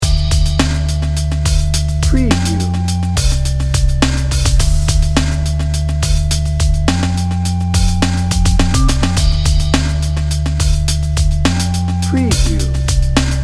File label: mellow chill jazzy dnb